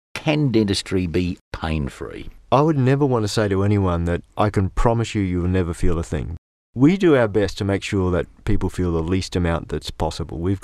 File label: pain